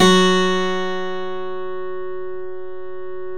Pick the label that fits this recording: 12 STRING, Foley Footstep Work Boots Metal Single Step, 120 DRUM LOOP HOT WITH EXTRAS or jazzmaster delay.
12 STRING